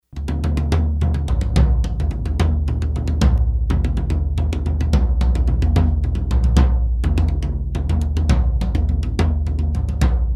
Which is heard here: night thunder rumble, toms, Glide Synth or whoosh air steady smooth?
toms